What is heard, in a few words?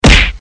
punch